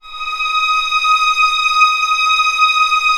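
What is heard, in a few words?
strings D#4 PP R; Sound On Sound - Peter Siedleczek's Orchestra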